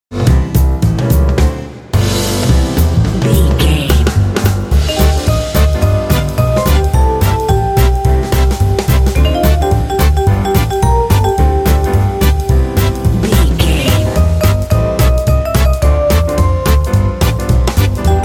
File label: we are swingin again